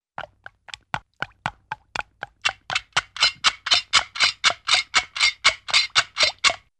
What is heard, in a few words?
Drips Change Into Mechanical Clock-like Tempo, cartoon; Hollywood Edge; Cartoon Trax